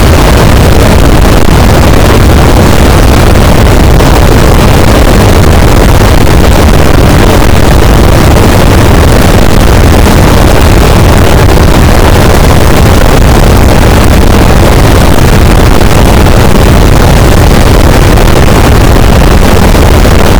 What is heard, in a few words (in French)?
cachalot positif, boat; Physeter Macrocephalus